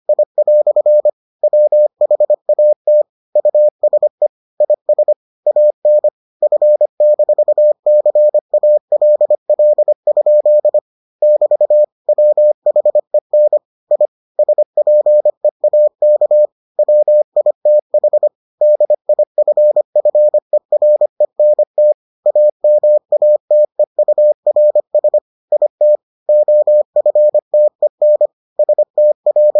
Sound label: morse